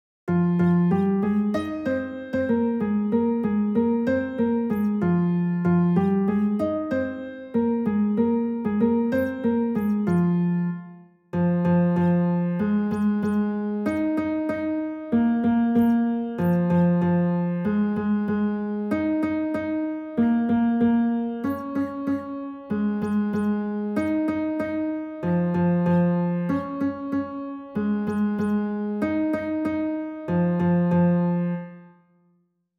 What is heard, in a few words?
alternative guitar; level 2